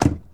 Truck Expedition Door Close; Trucks

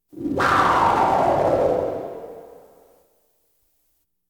Science Fiction effects, Lasers, Light beams

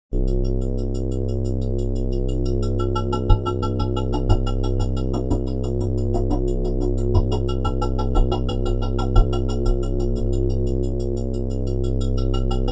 ambience scifi; NCH Library

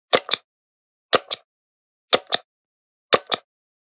Generic Button Wood Medium; boom library; The Interface

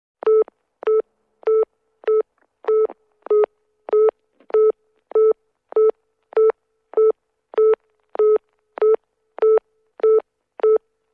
Telephone, European Busy Signal, Foreign; Office; Sound Ideas; Series 2000

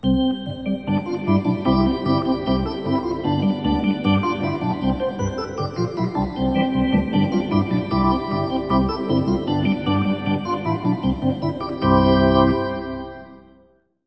Hockey Organ

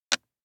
DICE on Plastic, Drop, Standard, 2 Two Dice; Samples; ArticulatedSounds; DICE Sfx Party (Extended)

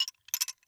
Test Tube Grab Multiple; Medical; Blastwave

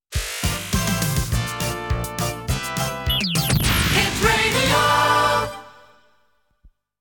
Hit Radio, jingles

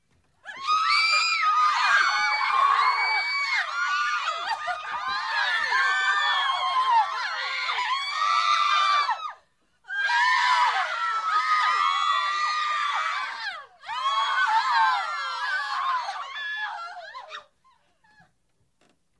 Crowd Panic Walla; Blind Drive

